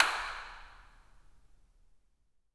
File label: incinerator dirac